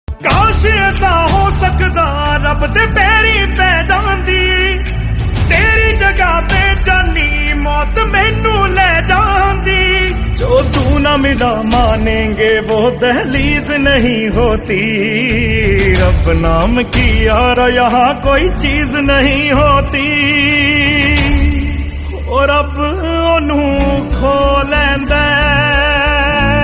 Sad Punjabi Ringtone

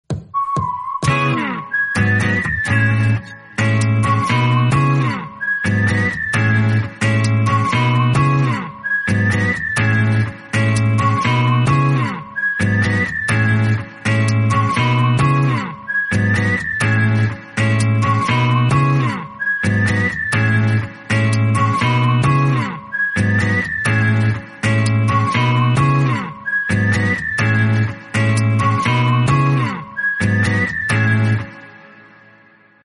Korean Instrumental; ringtone

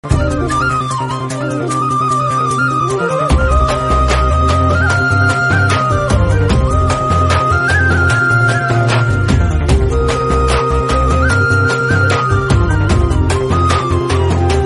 BGM Tamil

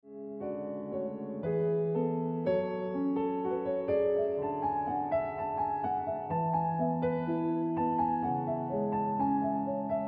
Ringtone Piano Music

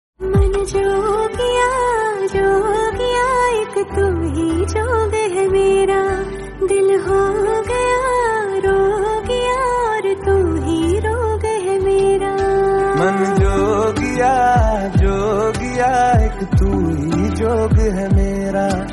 Female Version Hindi; ringtone

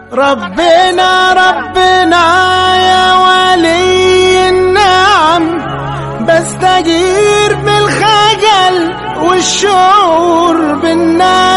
Dj Arabic Song

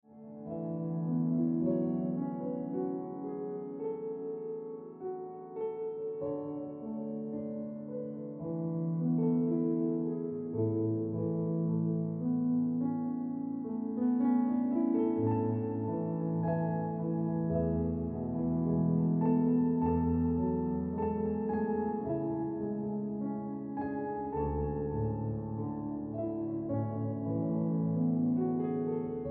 Piano Music

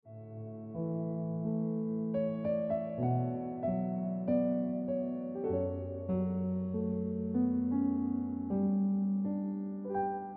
Piano Music

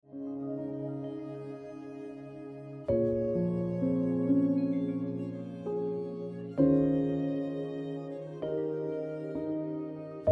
Piano Music

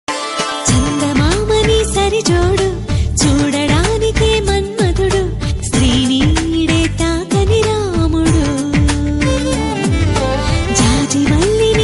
Tamil South Indian

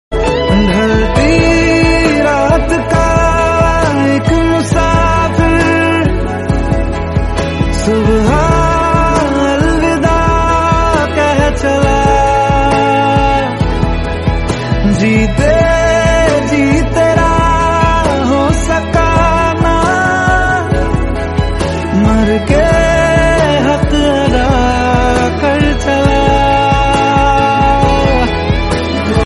Sad Hindi

